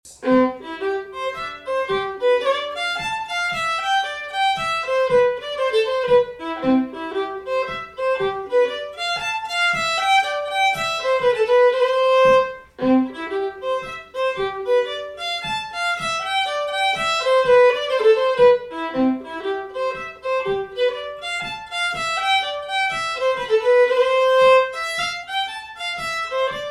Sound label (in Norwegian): Polsdans fra Lofoten (slow, fast); trondelag northern norway